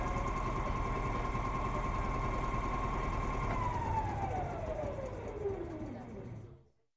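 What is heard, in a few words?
compressor end, Train; LT1938; OpenBVE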